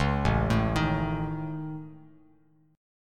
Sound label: A Major Flat 5th, Bass Standard; AMb5; arpeggiated; JGuitar